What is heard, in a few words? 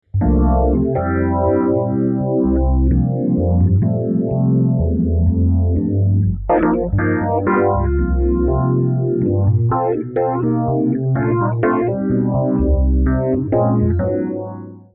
Smallstone, fender rhodes